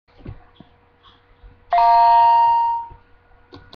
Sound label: Correct Answer Sound; TV THEME GAME